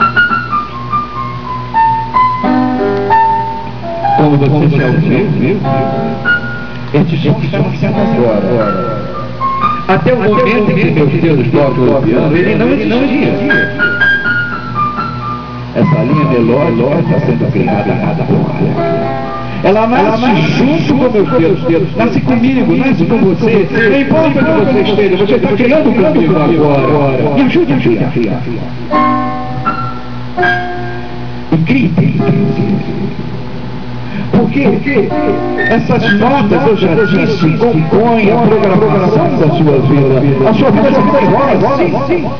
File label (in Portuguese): som cantado, para o programa